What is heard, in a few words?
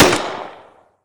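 grenade launcher; Guns; FPS Kit Version 2.0; slendytubbies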